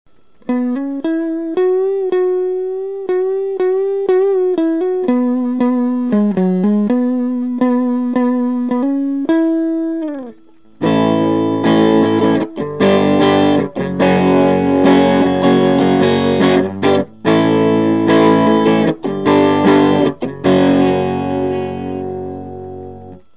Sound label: clean